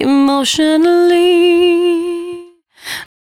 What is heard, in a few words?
113bpm C#, Velvet Vocal; Zero-G